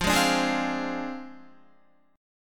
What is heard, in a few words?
F Minor 6th F down Guitar; strummed; tuning 4E,4A,5D,5G,5C,6F